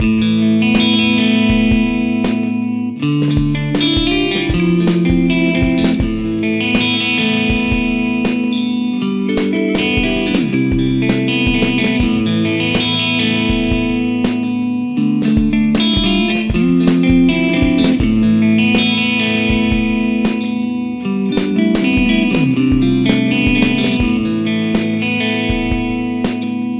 Fender American Original 60 Jazzmaster Both Pickups